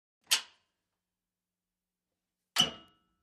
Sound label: Wash Mach Lid Open Clo; washing machine